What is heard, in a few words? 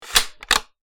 Rifle bolt cycle; assault rifle